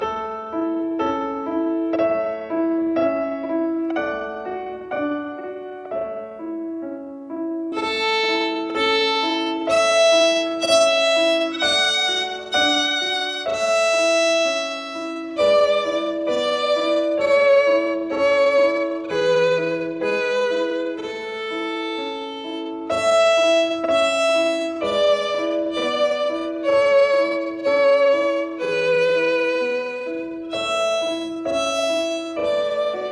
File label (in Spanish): Twinkle Tema Lento